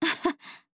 あはっ

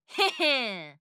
へっへーん

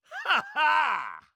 ハッハー！

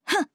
攻撃

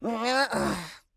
ンァーア

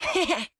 ヘヘッ！

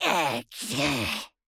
エーックシュー…！

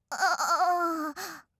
"あぁぁ〜…"

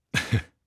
はにかむ

